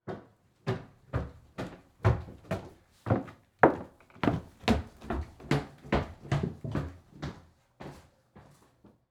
Foley Footstep Hard Sole Jogging Up Stairs Mic In Middle; Foley Footsteps